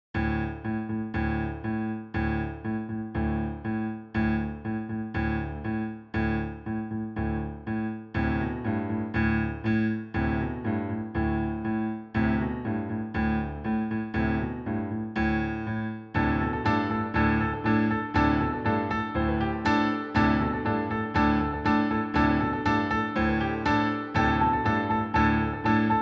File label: Improv, Piano